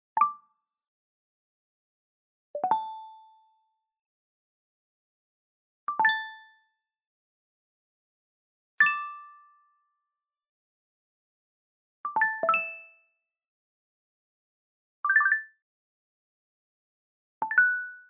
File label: notification sound effects